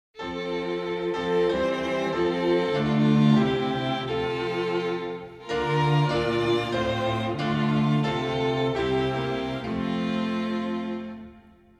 instrumental, hymns

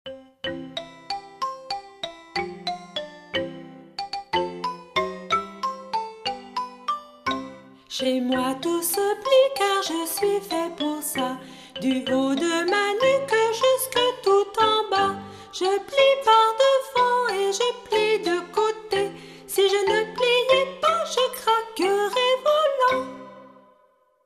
words and music; childrens songbook; french

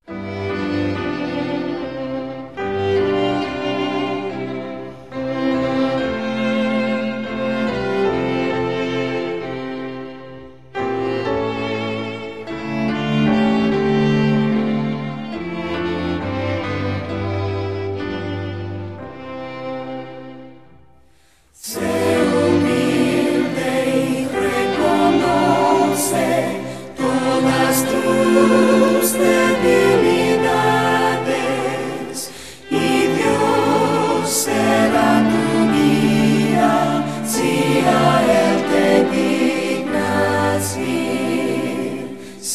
vocal and instrumental, hymns spanish

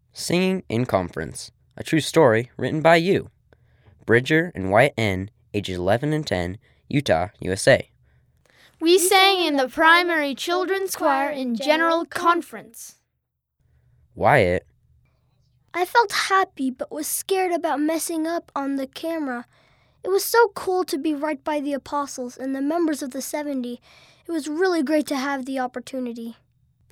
singing in conference; friend november 2015

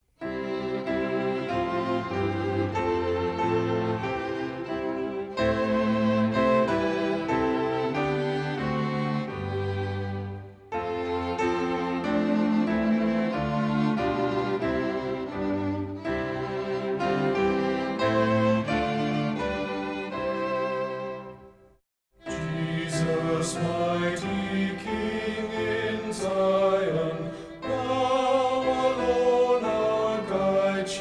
hymn vocal and instrumental

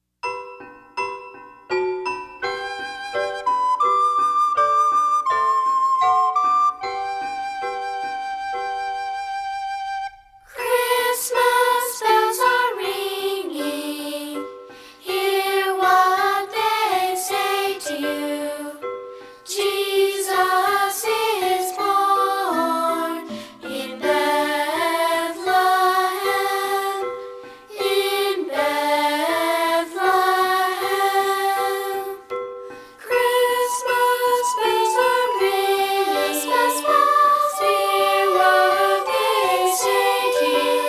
christmas bells words and music; childrens songbook

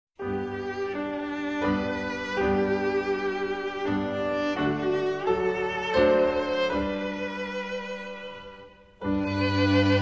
vocal and instrumental; hymns spanish

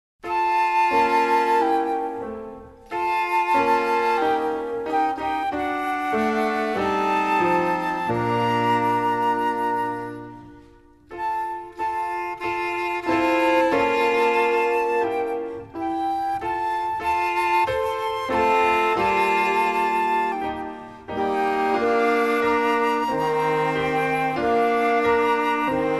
music only; the still small voice; japanese; childrens songbook

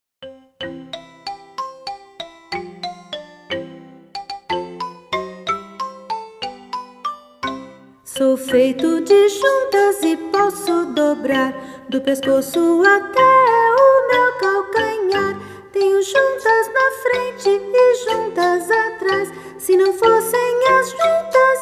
words and music, portuguese; childrens songbook

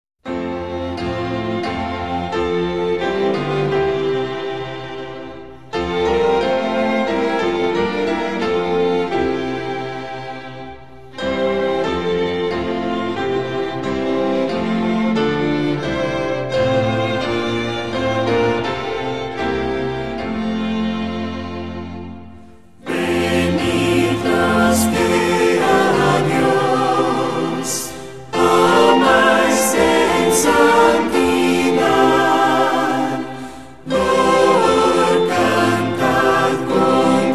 vocal and instrumental; hymns spanish

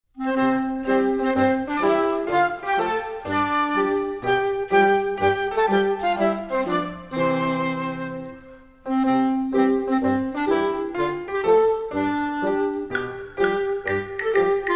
music only, childrens songbook spanish; popcorn popping

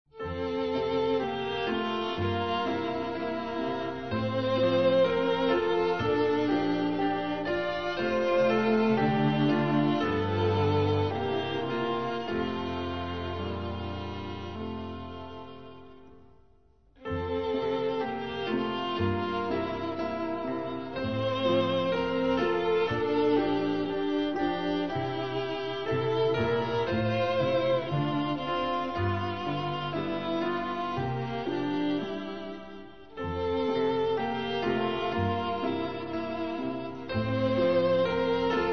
keep the commandments instrumental; hymns french

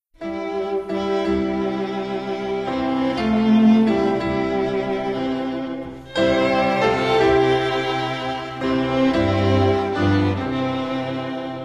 instrumental; hymns spanish

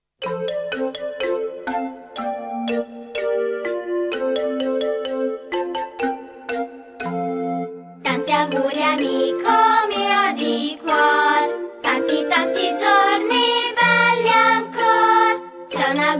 happy happy birthday words and music, italian; childrens songbook

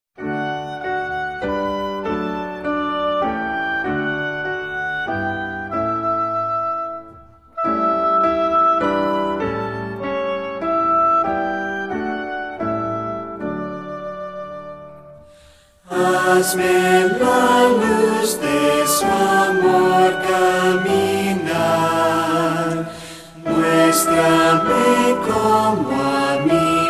vocal and instrumental, hymns spanish

